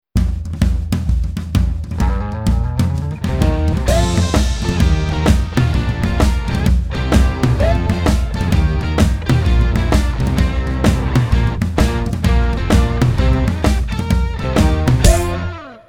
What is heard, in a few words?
alt GTR; Tosh.0